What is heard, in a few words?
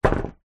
brick piece small set down on metal panel